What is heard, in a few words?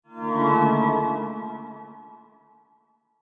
Guitar Chord Musical Magical Reveal Swell Transforms